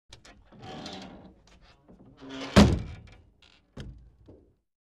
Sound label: wooden door opens and closes with creak